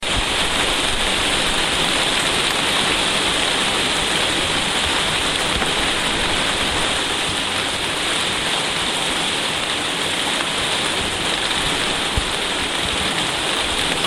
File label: very heavy rain on conservatory roof; recorded on olympus dm5